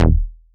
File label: Bass Cuts; Instruments; VES1 Bass Cuts